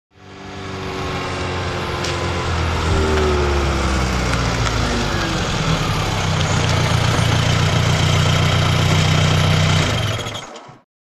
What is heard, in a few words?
Stop Off Audi 80l